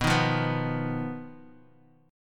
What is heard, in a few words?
B Major Flat 5th B Bass Standard; BMb5; strummed; 19,18,15,16